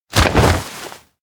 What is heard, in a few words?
jump; aino; anemoi